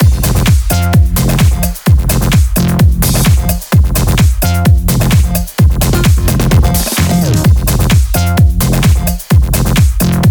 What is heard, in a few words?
129BPM House Mix; Vengeance Samples; House Of Love Kit